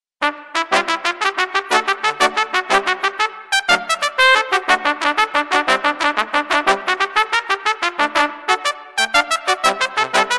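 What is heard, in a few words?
Power Trumpet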